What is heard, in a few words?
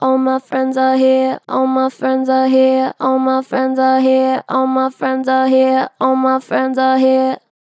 Strong Flat, Harmor Vocals, Pitch Flat Strong; All my friends are here